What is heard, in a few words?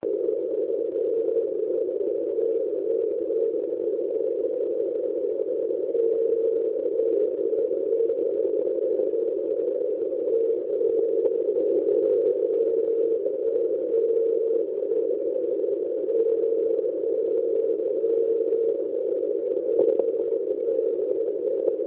beacon 80uW; OK1IF beacon 80uW; SuperQRPtest; u ok1hdu